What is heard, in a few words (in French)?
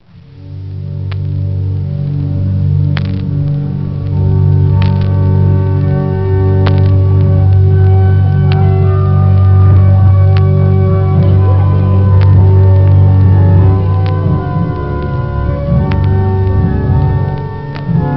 les jeux de fond du récit, autre extrait (gambe, flûte octaviante, octavin...), orgue; orgue cath oran